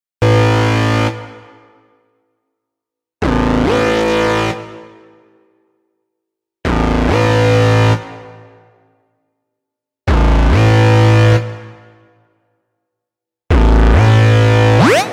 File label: SYNTH